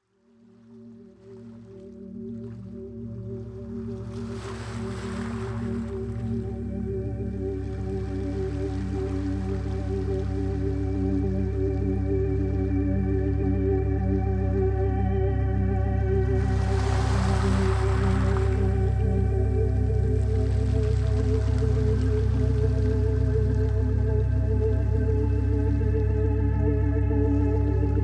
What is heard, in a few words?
sleep music – deep and relaxing music with delta waves